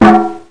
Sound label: Wrong BASK